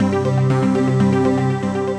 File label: Glitter Box, Melodic Arps; Ableton; Mood Reel